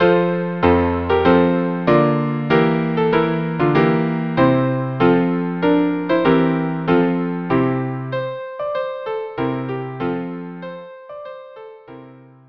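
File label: quartet; Telemann Helden 9 La Douceur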